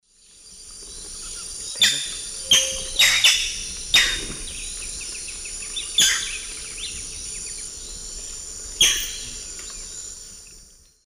Butorides striata, flight calls; S. America; Eastern Lowlands

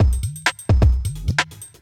electro kit 130

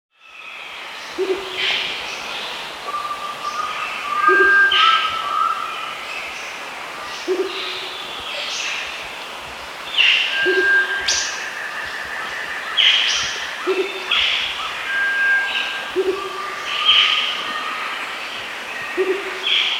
Rufous Motmot, Baryphthengus martii, S. America

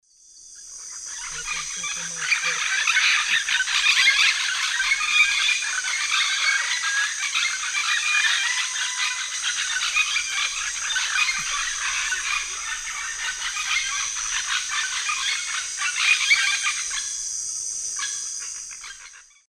Amazona amazonica, calls; S. America; Eastern Lowlands

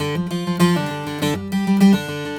Guitar, Acoustic

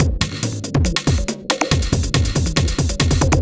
ind 140 kit

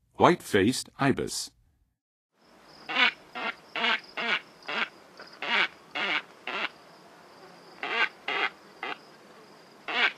White-faced Ibis; North America; Western USA; Western USA Songbirds

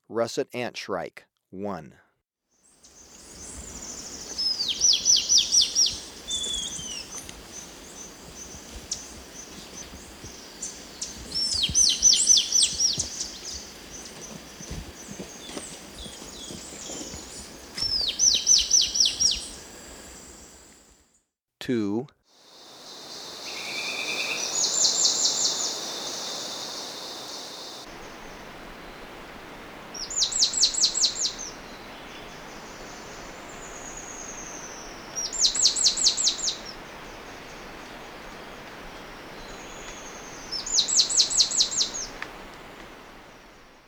Russet Antshrike, Birds of Ecuador; S. America

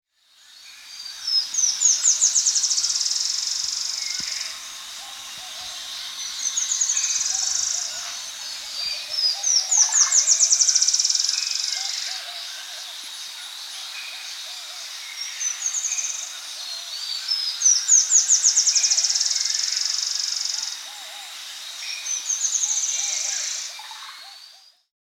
Yellow-Rumped Antwren; Terenura Shar; S. America

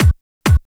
DESIGNER DANCE TOOLS

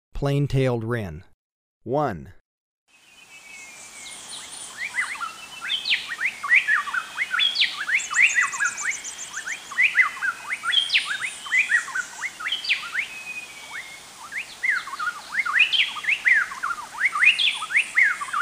Plain-Tailed Wren, Birds of Ecuador; S. America

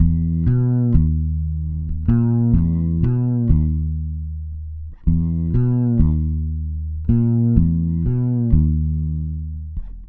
Bass Hip-Hop

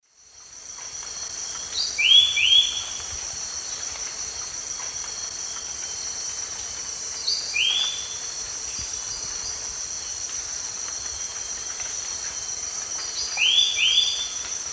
Henicorhina leucosticta, song; S. America; Eastern Lowlands

